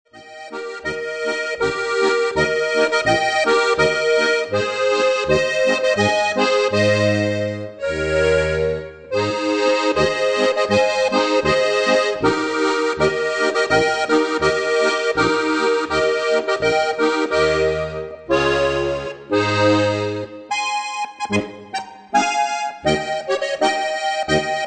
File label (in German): landlerisch tanzn